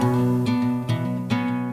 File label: guitar, Jazzmatazz loops